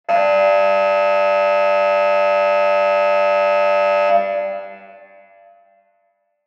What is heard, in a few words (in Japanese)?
劇場やホールの開演ブザー リバーブ有り; 「演劇・舞台」の効果音